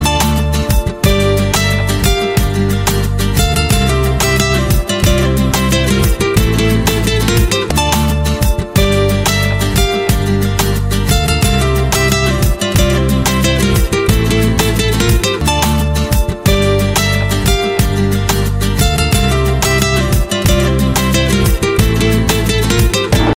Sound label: Pure Love Guitar